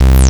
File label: Industrial Analog Single Notes Short